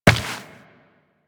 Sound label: Step Rock, Footsteps Walk; DE; Warframe; Tenno